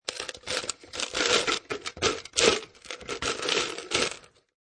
Drink Straw, Ice in cup being stab by drinking straw; Miscellaneous Human